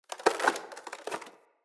Amb Dead Tree Texture; Ambience; Mendicant Area

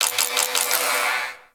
Rolo Dex Swipe; Ambience; Props; Corpus Ship

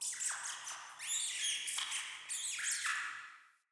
Cave Mine Rat

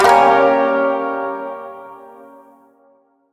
Shawzin Phrygian Chord H; Chords; Warframe

